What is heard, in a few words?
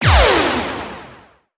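Sound Effect Penalty HP 0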